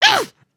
Angry, emotes

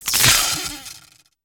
spark; spaceship

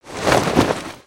Pirate Roll Over; gnarled forest

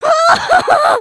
freakout; emotes

